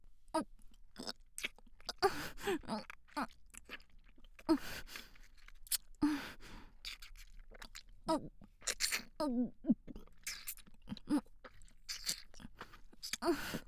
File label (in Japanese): 喘ぎ声(3P)